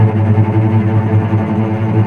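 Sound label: tremolo, strings; East West; The Ultimate String Collection; VCS TREMOLO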